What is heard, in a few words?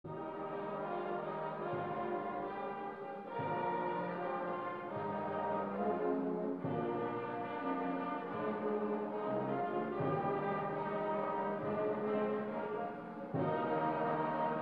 Band, College Song